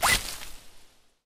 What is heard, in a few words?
plant slip; casualtiesunknown